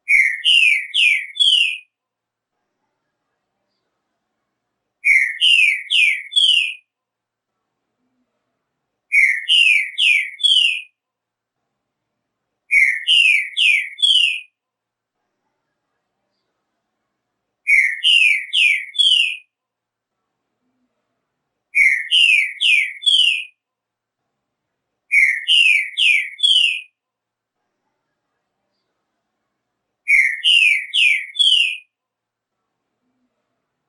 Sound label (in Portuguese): Canto Trinca Ferro; Bom Dia Seu Chico